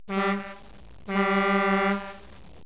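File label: TRAFFIC, car sounds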